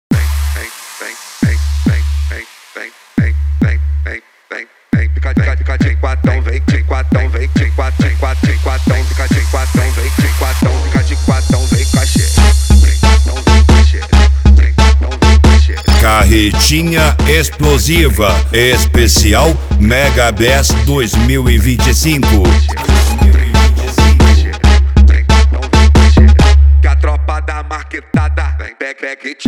mega bass

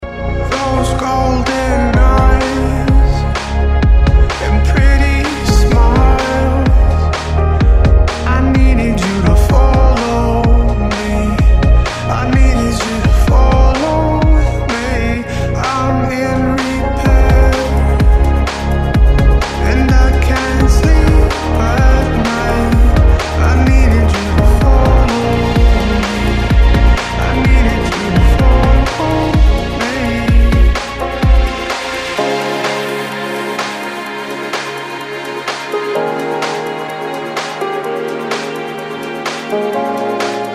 progressive eletronic music

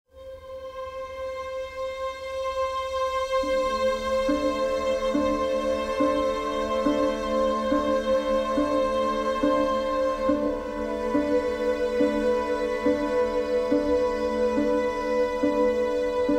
instrumental

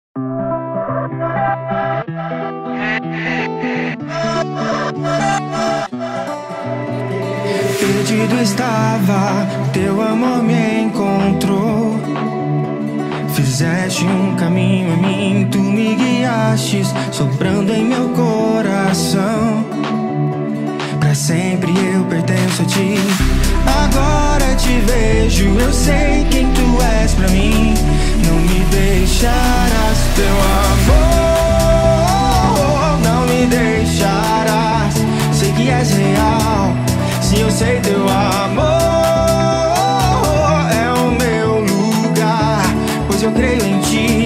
remix gospel

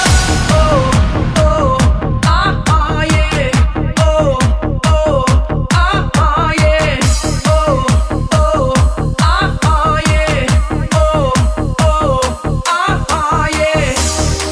flashback

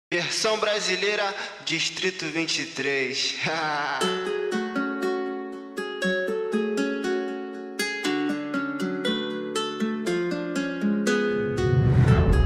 trap

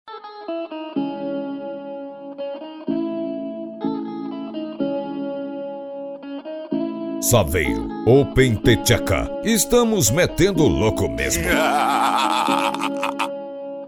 bass; saveiro open the theca

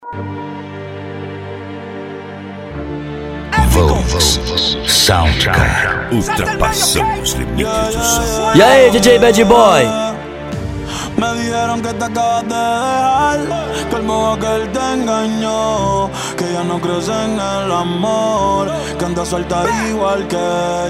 sound car reggaeton; cd volks sound car

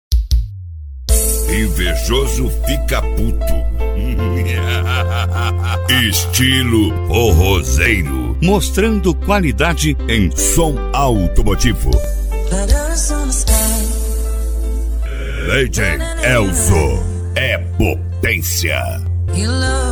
reggae; stilo forrozeiro 2024

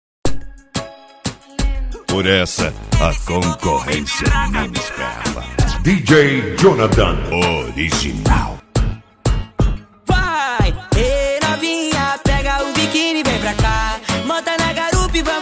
reggaeton mix; reggaeton mix evolution

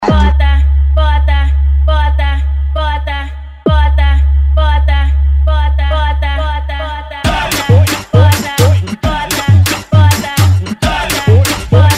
mega funk bass

